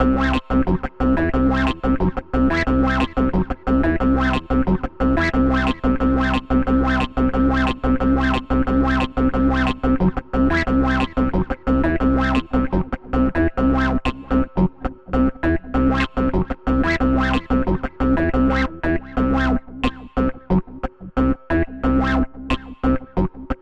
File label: Catwalk Bassline